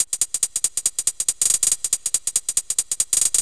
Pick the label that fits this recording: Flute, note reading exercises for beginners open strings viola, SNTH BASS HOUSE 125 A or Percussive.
Percussive